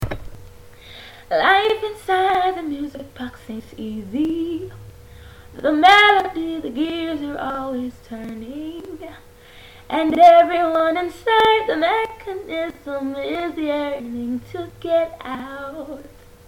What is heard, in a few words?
musicbox